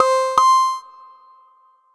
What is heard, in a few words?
access allowed tone; mixkit